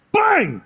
bang

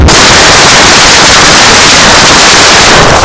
glock sound distorted

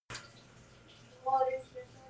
cut sound

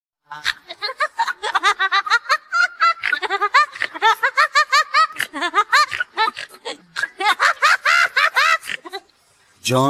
insane laughter

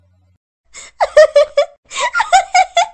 laugh

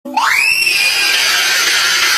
scriemeng

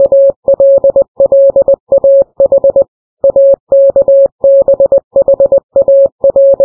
morse code thing

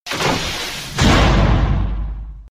door close; scp

